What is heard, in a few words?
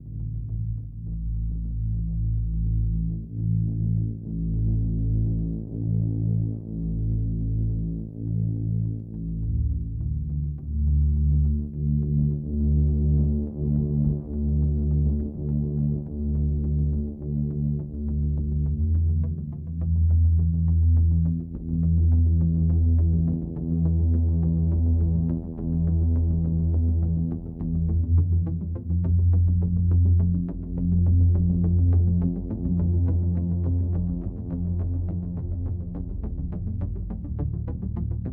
loop Stealth